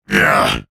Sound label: Grunt Hit; paladins; Lazarus